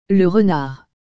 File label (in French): renard; les animaux